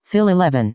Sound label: Fill